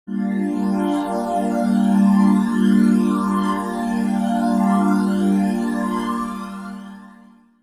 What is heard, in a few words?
Digi Pads; Jungle Frenzy 1